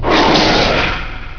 monster scream

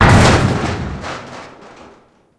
metal break

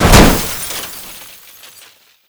break

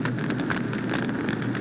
torch fire

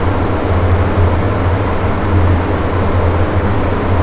fan

